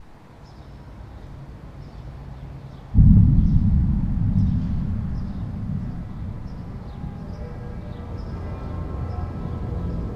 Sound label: Atmosphere Rome Eerie